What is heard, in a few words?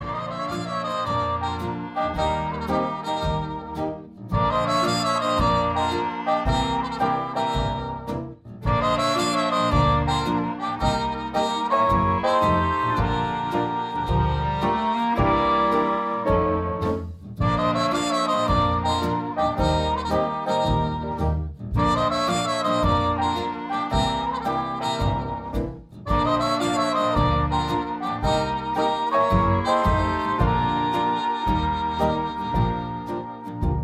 Jazz Orchestra